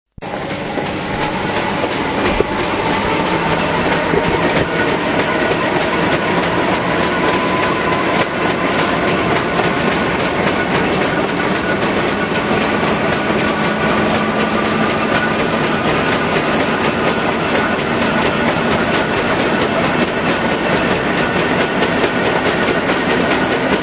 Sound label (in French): ambiance rail